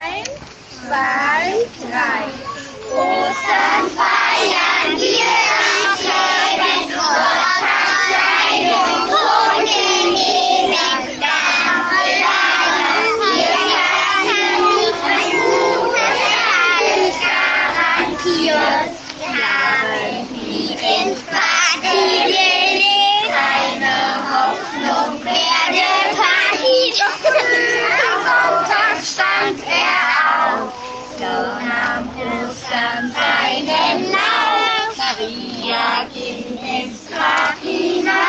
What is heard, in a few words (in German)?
Lied Ostern